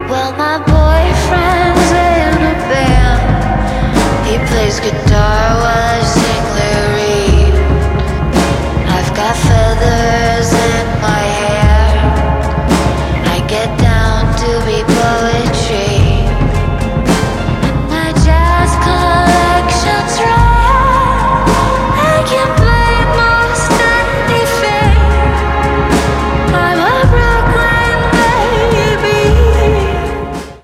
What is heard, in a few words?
Chorus Sample